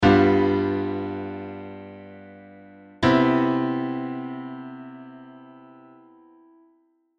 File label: G7 Cmaj7